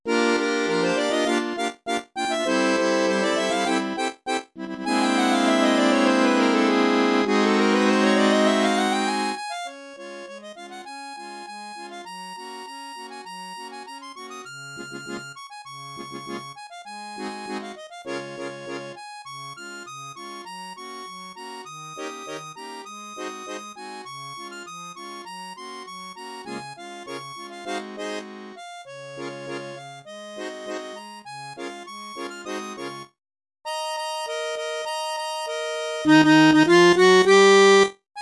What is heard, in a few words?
Synthesized audio for study; harmonica; Doucette